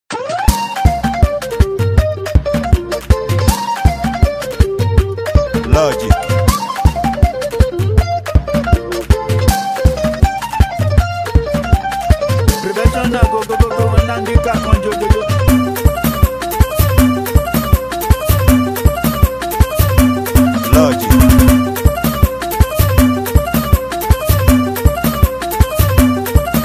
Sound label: Professional Beat